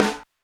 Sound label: Bangin; Airwindows; BeyondSnares